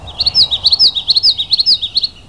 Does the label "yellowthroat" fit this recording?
Yes